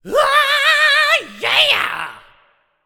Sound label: idle sing; smite; apollo